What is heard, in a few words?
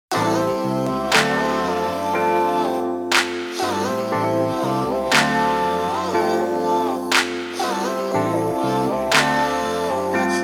Instrumental